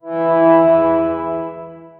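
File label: epic dark horn and trumpet, horns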